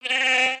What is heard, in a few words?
Pet Goat Hurt; creatures pets